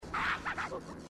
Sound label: grunt running scream